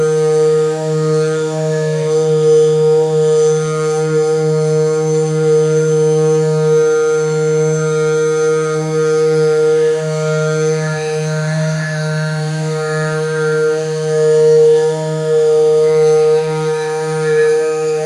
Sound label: indian barber shop with, people talking